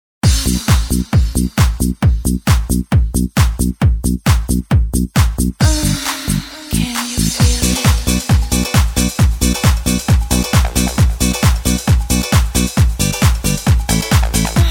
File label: Disco Kar